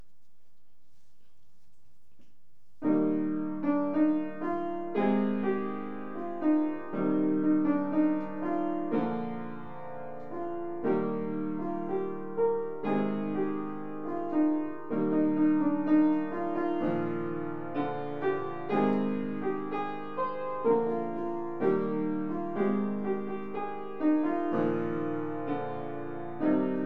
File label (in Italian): Bassi; benedicat